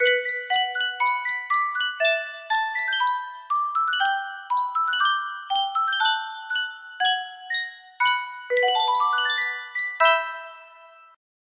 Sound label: Sankyo Music Box, music box melody